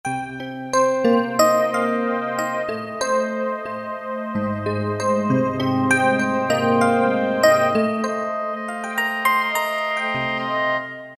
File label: Clear Bell Pad; Roland PN-D50-04